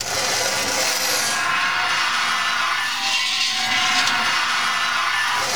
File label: scrape loop; gmod; lvs framework; physics